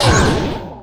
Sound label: Pickup Scifi Energy; SFX Kit